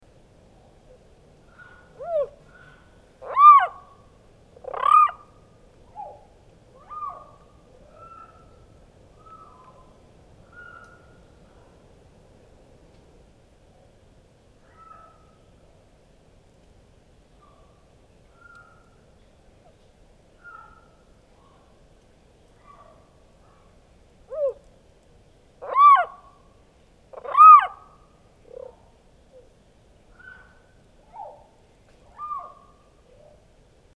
okinawaishikawagaeru call, frog

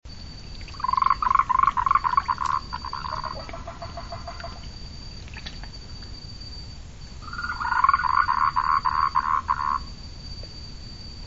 yaeyamaaogaeru call, frog

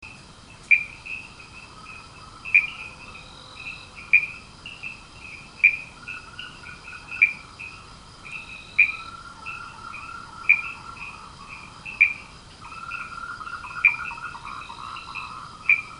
aifinger call, frog

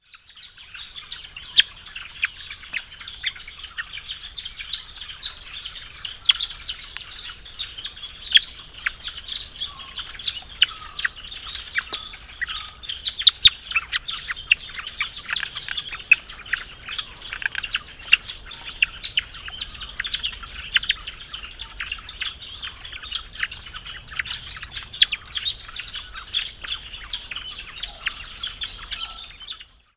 hanasakigaeru call, frog

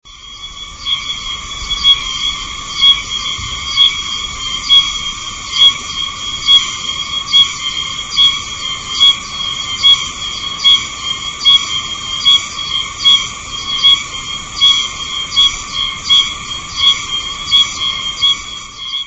oosimazemi call; yanbaru

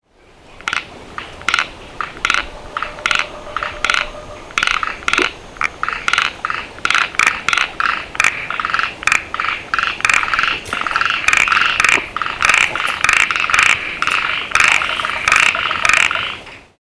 yaeyamahimeamagaeru call, frog

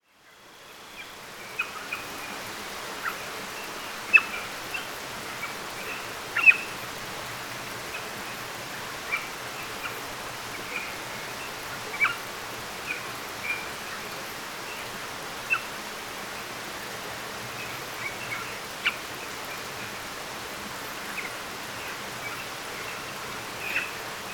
kogatahanasakigaeru advertisement call, frog

